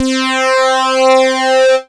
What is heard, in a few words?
Casio VZ-10M Phased Synth C4